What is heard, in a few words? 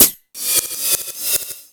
High Hat, Drum Machines E-MU Pro-Cussion